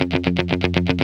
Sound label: GUI, 120 BPM